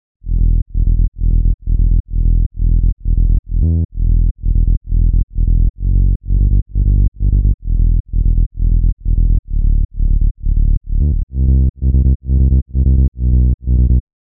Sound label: sub bass